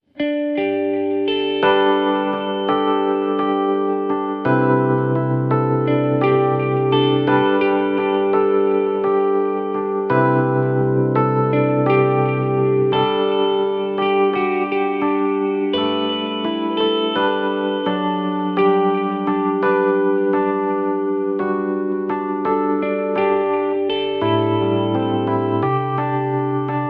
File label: Electric Guitar and Rhodes